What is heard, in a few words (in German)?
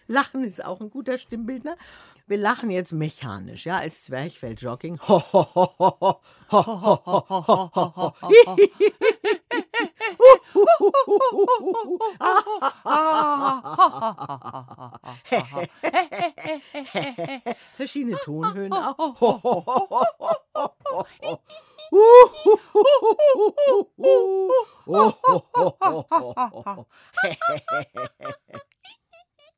lachen mechanisch